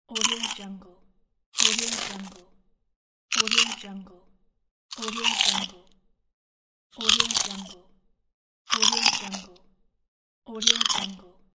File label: eating chips